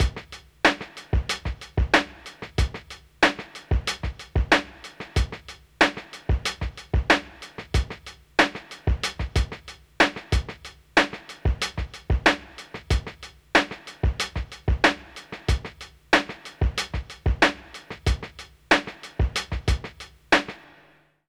Drum Loops, Minimal; Zero-G Smoke

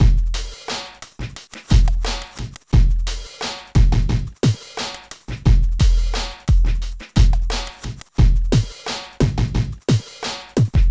Beat, Drums & Percussion; Electrocution; Beats

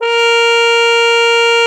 COFF A#4, CUP MUTE; Xsample VOL-3

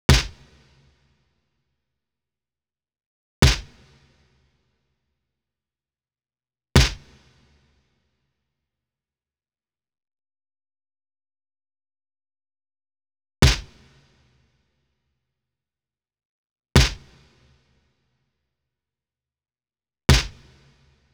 drum track snare, Smooth RnB, 072 G#min

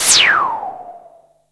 NOISE SHOT; Best Service Alpha Dance vol.2

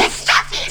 TECKNO; AMG - Vince Clarke Lucky Bastard

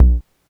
polyvox bassdrum; Formanta Radio Plant

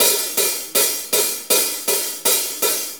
Shuffle HiHats; Sonic Foundry (Sony Creative Software); Drum Tools; Shuffles; HiHat Loops

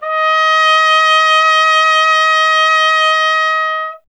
Trumpet D#0; Miroslav Vitous - Solo Instruments VOL-1

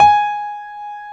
WS GRAND G#4; Analogon

